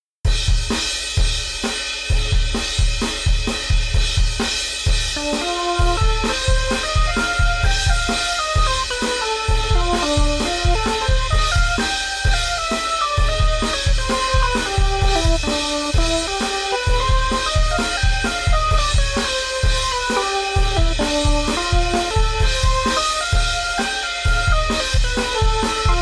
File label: Sample drum big